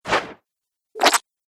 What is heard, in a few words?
Custard Pie Splat Throw; Cartoon Sound Design Sound Effects